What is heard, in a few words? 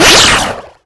Warp Sound; blastmorph pinball; xbox series xs